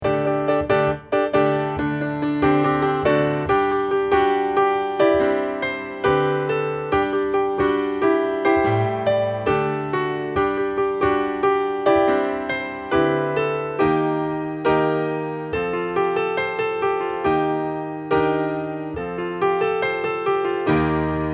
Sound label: accomp